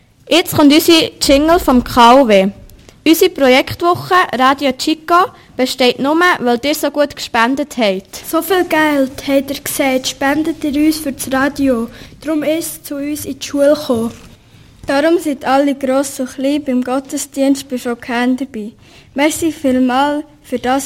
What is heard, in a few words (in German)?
Werbung Jingle; Unterseen